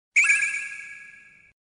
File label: draw point; final fantasy viii sound effects